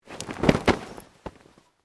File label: Clothing Shirt Cotton Flap Sharp; Movemement sounds for various articles of clothing; Dressing